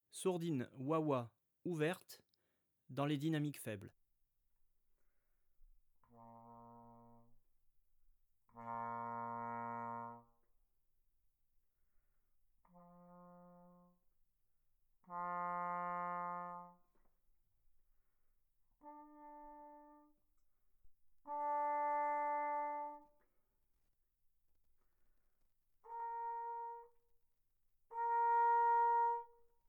sourdine wa-wa ouverte dynamiques faibles, trombone; Sourdines; Sourdines fixees; traite orchestration; trombone tenor trombone basse

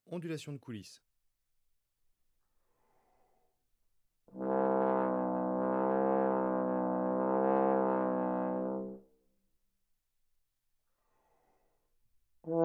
ondulations de coulisse, trombone; Effets; Ondulations vibrato; traite orchestration; trombone tenor trombone basse

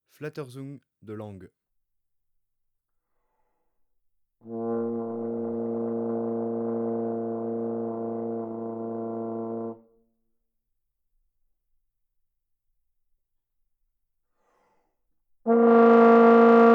flatterzung de langue, trombone; Textures; Sons granules sonores; traite orchestration; trombone tenor trombone basse

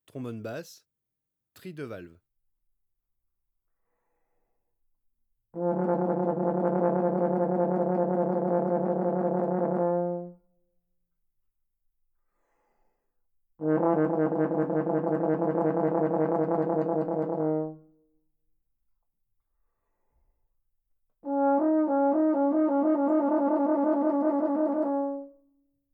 basse trilles de valves, trombone; Effets; Trilles battements; traite orchestration

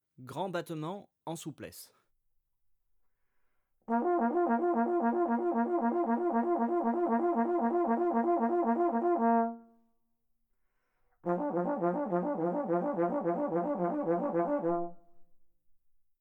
grands battements en souplesse, trombone; Effets; Trilles battements; traite orchestration

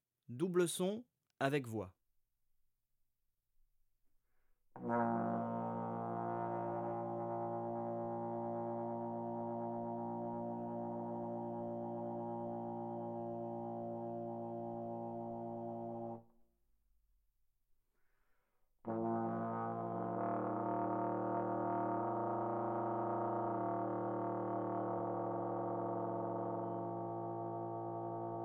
double son avec voix, trombone; Textures; Sons complexes faibles; traite orchestration

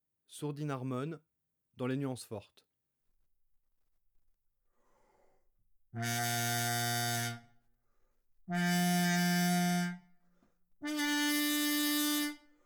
sourdine harmon dans les nuances fortes, trombone; Sourdines; Sourdines fixees; traite orchestration; trombone tenor trombone basse

